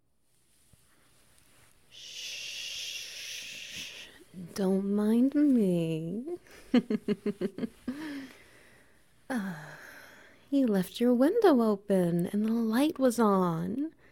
Wet Noises

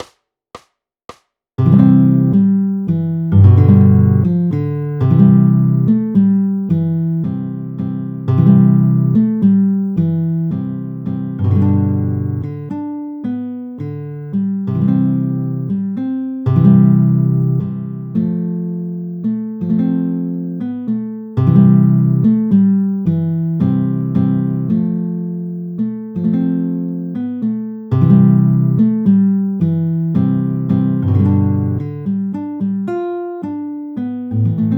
Silent Night - Basic Chord Melody in C

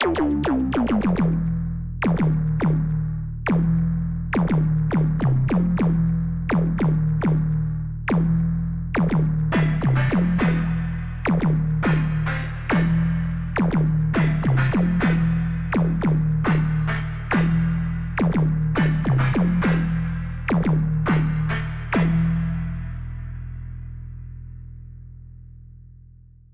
Synth; Snoozetown

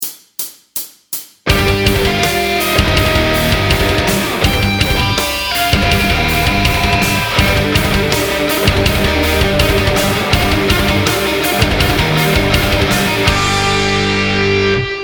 Super Metal Scale

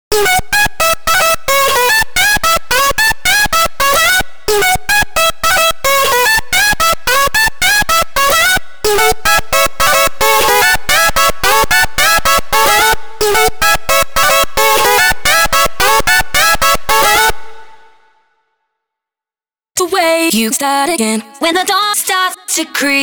start again, 220bpm

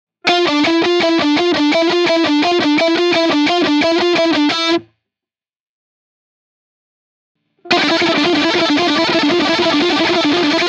Sextuplet Lick; Yngwie

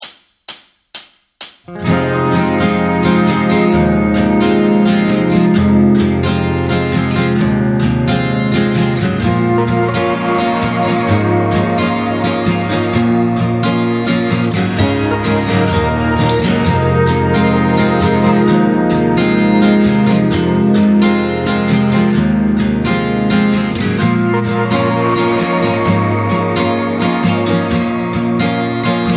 Guitar Soloing Backing Track

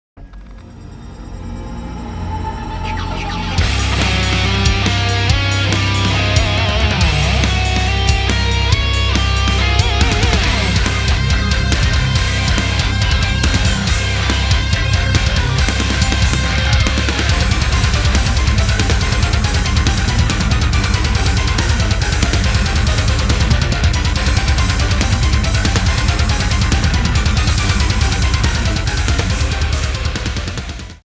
Metal; Shadow Queen Phase 2

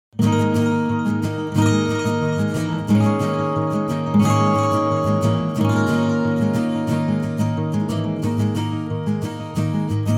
Acoustic; Theme of Zero

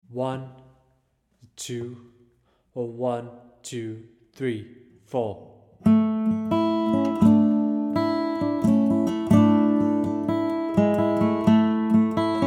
Guitar Steady; Grimstock

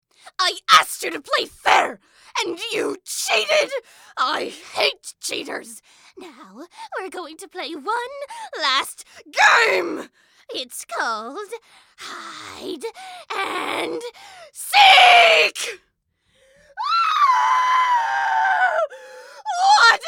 screams

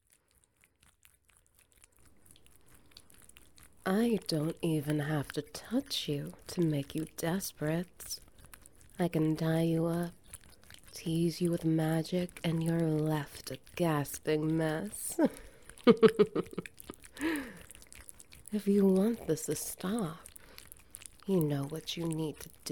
wet noises, SFX

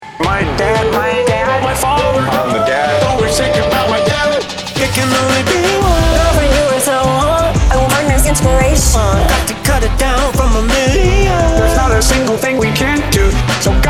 ringtone; There Can Only Be One

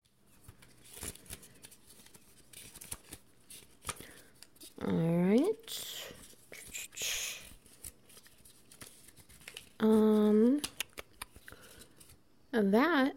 Ambience SFX Wet Noises

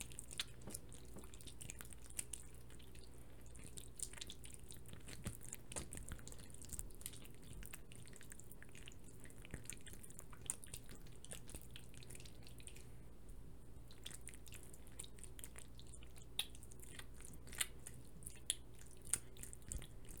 just tentacle noises no moans; Fancy a Tentacle

